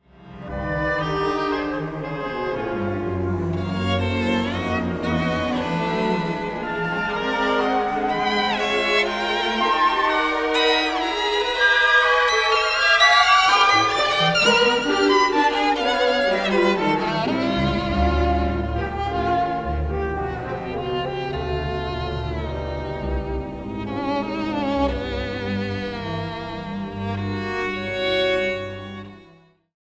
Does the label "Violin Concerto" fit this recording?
Yes